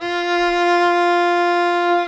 Violin Bit

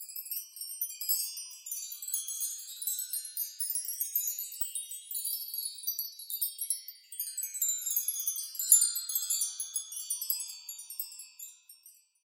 Chimes

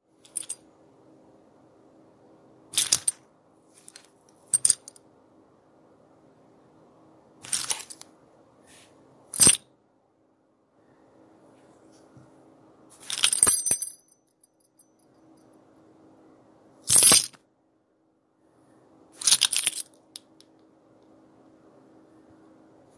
Keys Pickup

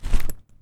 Grab Item

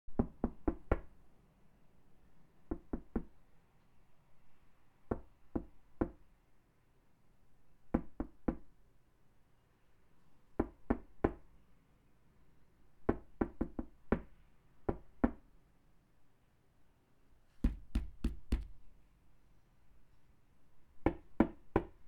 Knocking On Door

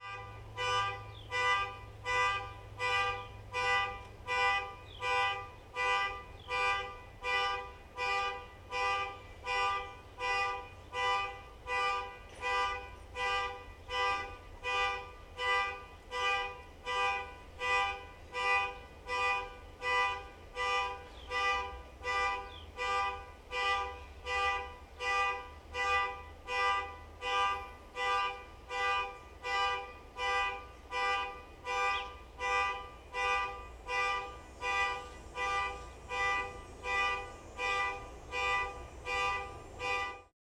Car Alarm Horn Honks Roof, Toronto

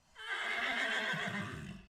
Horse Neighing

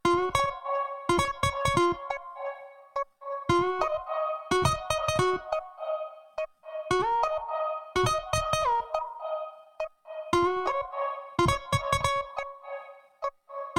140bpm 303 melody

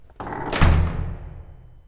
Metal Door Close With Creeks

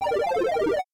Through A Door Down A Ladder

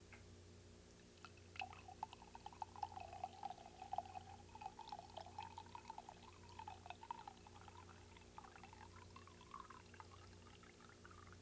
Tea Pour

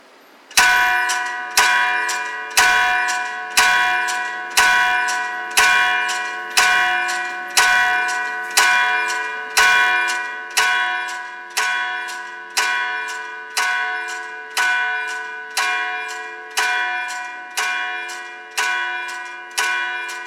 Bell

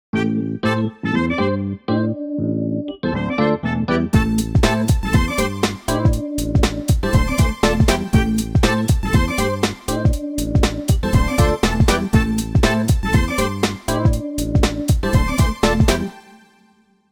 Funky Old School Beat

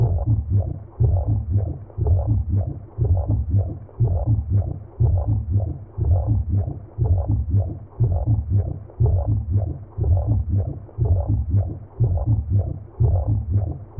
Gurgles

